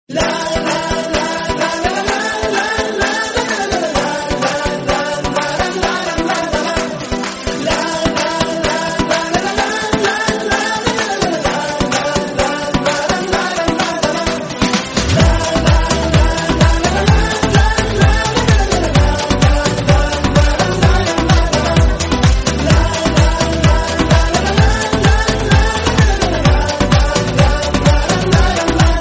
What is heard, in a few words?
great turkish music